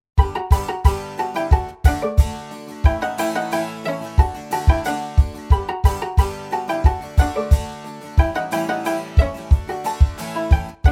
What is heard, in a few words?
Karaoke